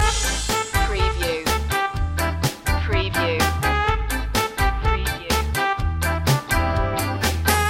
rainy day ska loop c

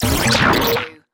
Hit Glitch

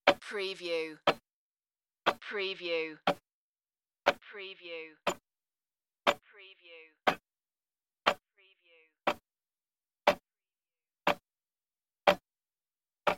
Old Clock Ticking Type 1 Thirty Seconds

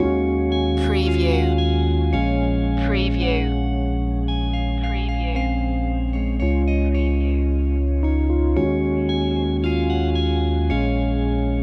stirring spirits loop

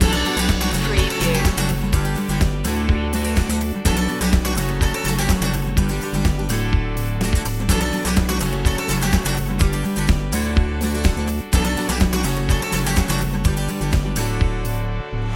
inspirational acoustic guitar loop

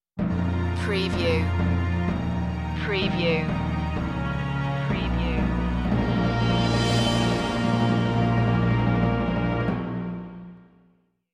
sting no choir

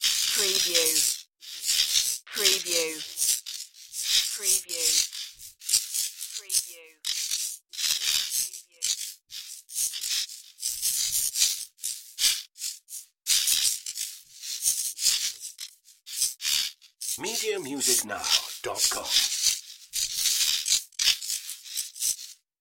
chaotic electric sizzle

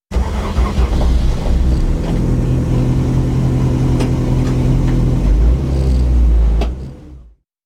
Rally car starting; beanstalk audio

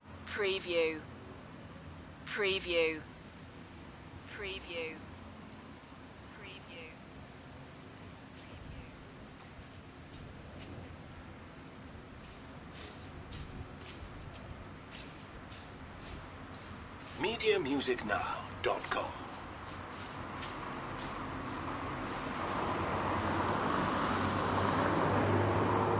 city traffic ambience

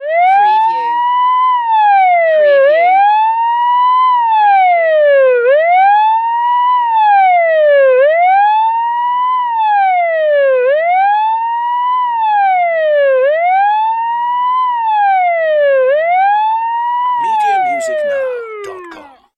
Ambulance Siren Mid 20th Century

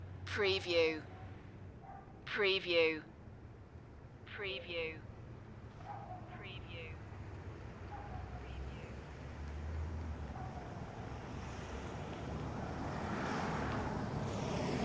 Urban Residential Dogs Distant Traffic Short

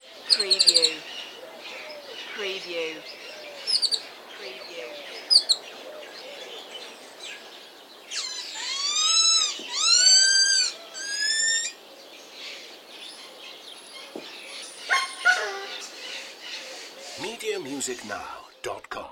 Ambiance Zoo Birds

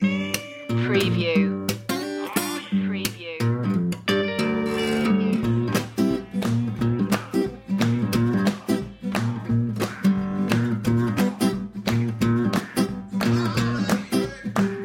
deadwood shuffle

